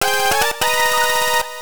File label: You Won Coins; virtual boy wario land gamerip